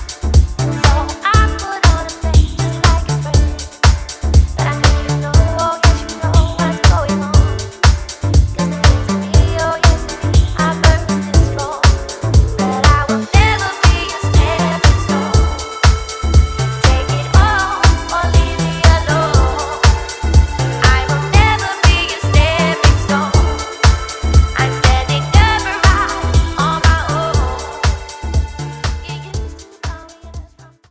Vocal

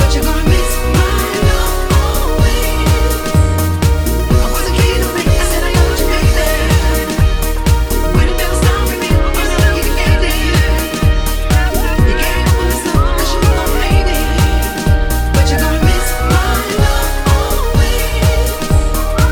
Vocal

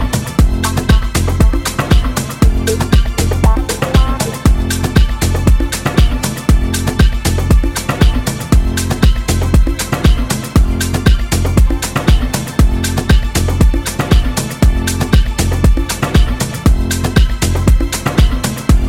Inst

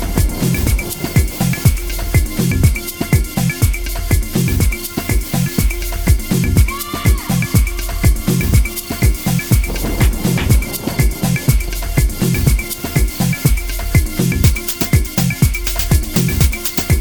Afro Soul Inst

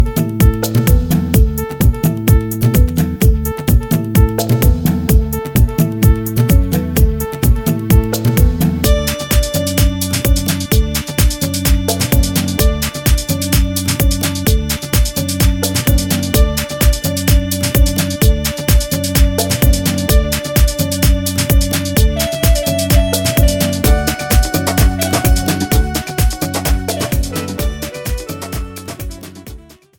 bass inst mix

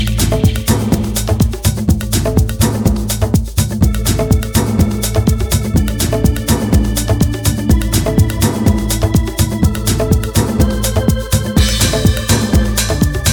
Inst

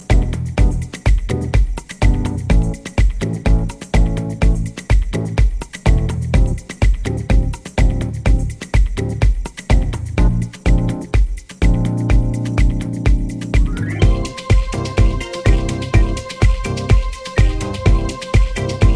INSTRUMENTAL

